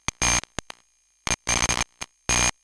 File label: glitched tones AM Radio Interference